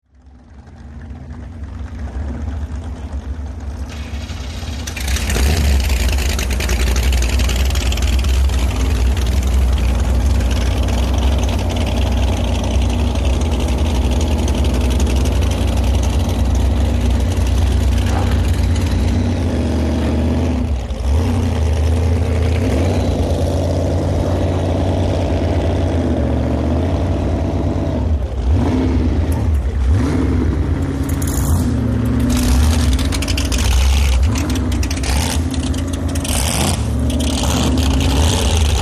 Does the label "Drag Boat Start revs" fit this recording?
Yes